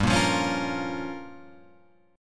G Minor 7th Flat 5th, Guitar Open F; Gm7b5; strummed; 7,5,5,8,8,5

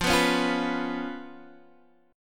B Suspended 2nd Flat 5th F

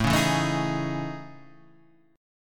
A Major Sharp 11th, Guitar, Standard; AM#11; strummed; JGuitar

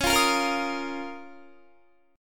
Db 6th Add 9th Db down Guitar; Db6add9; strummed

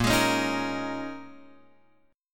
Bb Minor 11th, down, Guitar, Open F; strummed; JGuitar

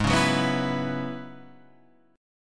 F sharp 7th Suspended 2nd Suspended 4th, G sharp

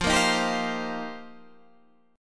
F 9th Suspended 4th F Guitar Open D, chord

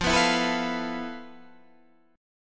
F Major 7th Flat 5th Guitar Open D

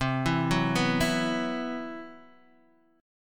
C Major 7th Flat 5th C, Guitar Open D

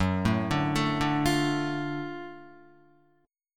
Gb Minor Major 7th Sharp 5th Gb down Guitar; arpeggiated; JGuitar

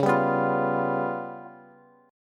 C sharp 7th Flat 5th, Bass; strummed; chord; 21,22,21,22

